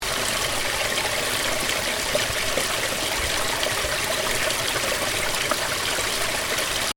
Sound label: Stream gentle loop, Stream: gentle water running, loop; Water Sound Effects; Water (Sound Effects:Nature); Stuart Duffield